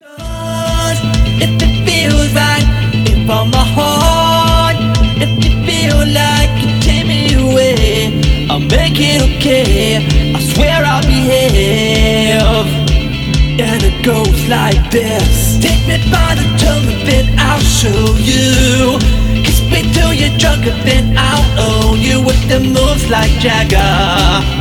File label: Heavy Beats, Dance and Rock Music; PURE DANCE BEATS